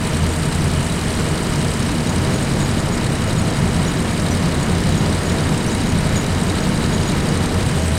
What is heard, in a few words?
m5a engine int rpm 99 load, engines tanks; War Thunder